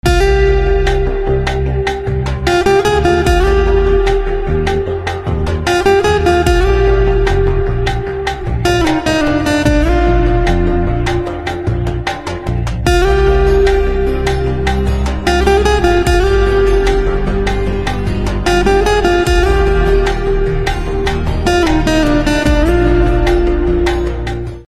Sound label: Guitar